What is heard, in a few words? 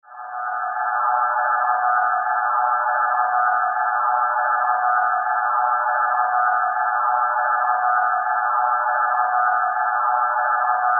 Sci Fi Spaceship; Spaceship Hover Ufo Space Drone; Spacecraft